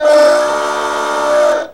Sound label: motor alarm